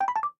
tweet send; FreakyBlue; TWBlue

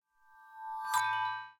ready; FreakyBlue; TWBlue

